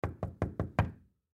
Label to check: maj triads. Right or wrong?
Wrong